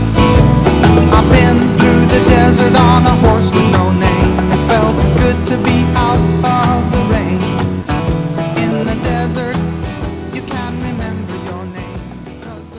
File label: radio